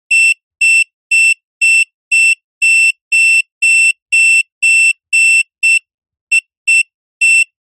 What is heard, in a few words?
toy metal detector beeps